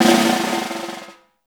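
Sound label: snare decrescendo pianissimo; Best Service; Peter Siedlaczek's Advanced Orchestra; SN DECRSC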